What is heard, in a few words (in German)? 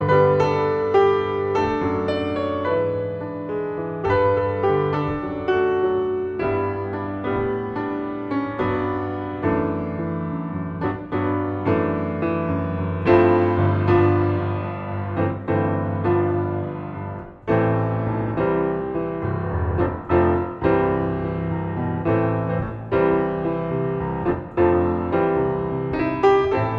Ueberleitende Improvisation am Fluegel